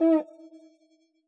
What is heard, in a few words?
cuica open, Yamaha DJX Rock Kit